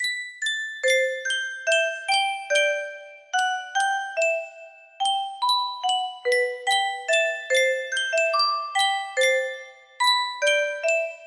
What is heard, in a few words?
Yunsheng Music Box, music box melody; attack on titan